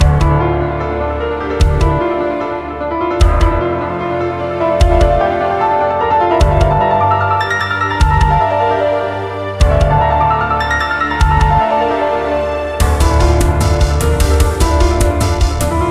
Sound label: Classic Dynamic Track Looped; Dual Blade: Battle of the Female Ninja